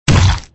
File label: Food Impact; Extra Lives